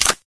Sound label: ump45 clip out, weapons; Source Engine; cstrike